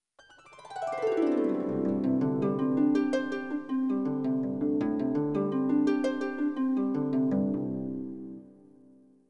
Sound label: harper tune; dragon riders chronicles of pern 2001 dreamcast gamerip